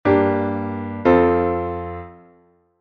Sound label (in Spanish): semicadencia